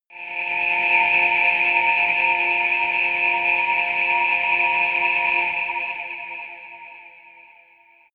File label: sci-fi quiet planet, drones